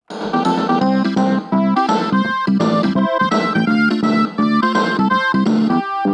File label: Casio SA-20